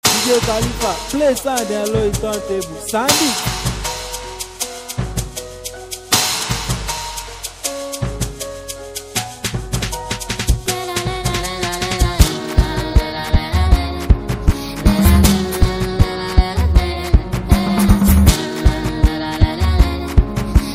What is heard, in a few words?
Emotional Mara Beat